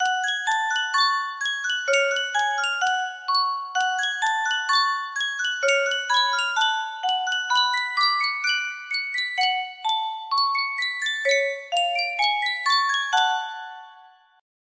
yunsheng music box, music box melody

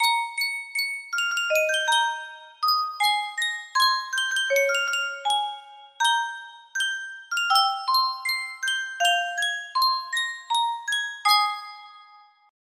Sankyo Custom Tune Music Box, music box melody

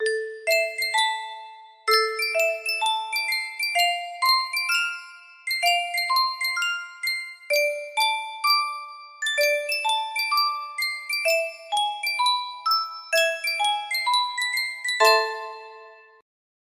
yunsheng music box, melody

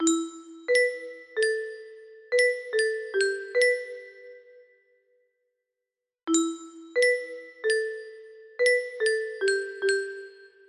motif, melody

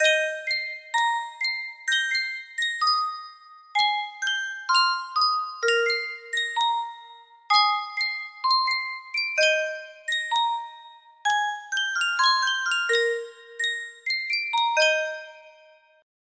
Sankyo music box

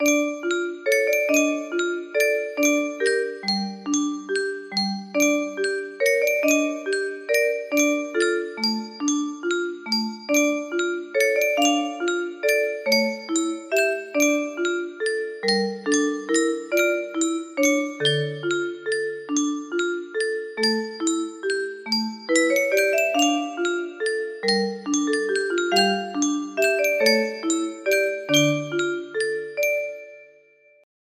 waltz, music box melody

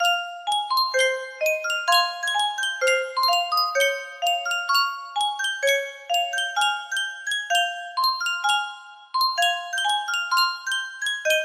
yunsheng custom tune music box

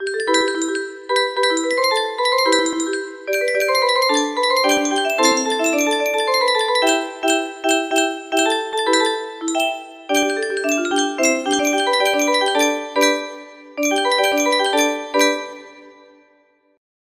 melody fast with high notes, music box melody

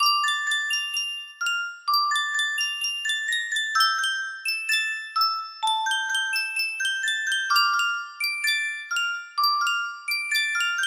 lador music box

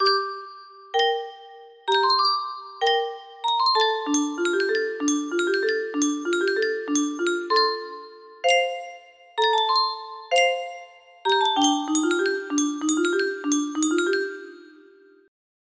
music box, music box melody; game of thrones

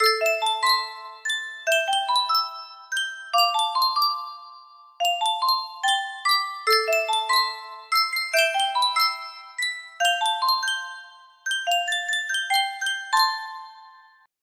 Sankyo music box, music box melody

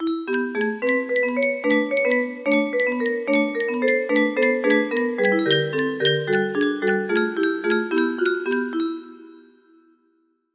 music test, music box

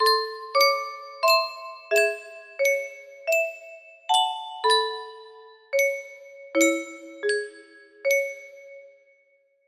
melody

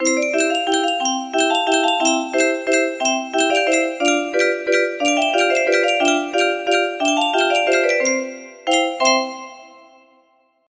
music box melody

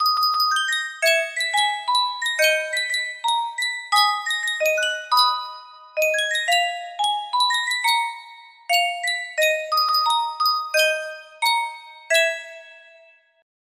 sankyo music box orchestral fantasia

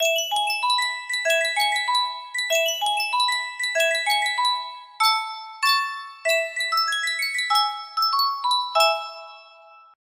Sankyo miniature music box

